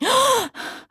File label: voc gasp female; oblivion; Realistic Fatigue